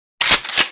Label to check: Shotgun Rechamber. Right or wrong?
Right